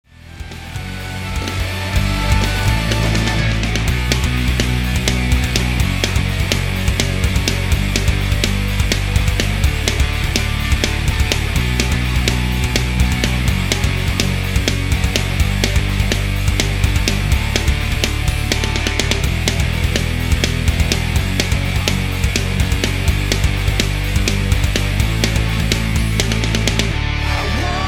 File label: backing slow, guitar